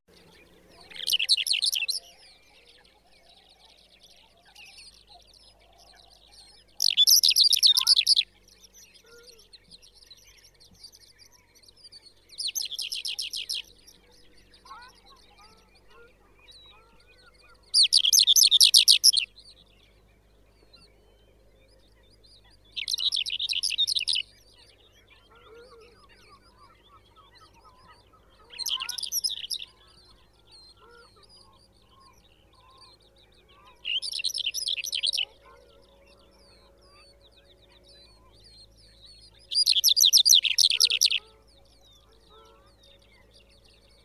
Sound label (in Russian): zhavoronok solonchakovii calandrella cheleensis, zvuki prirodi